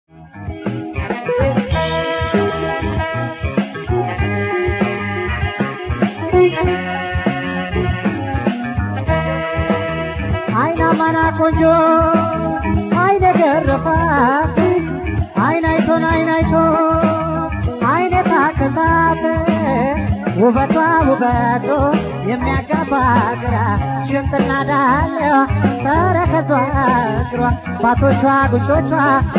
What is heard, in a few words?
tango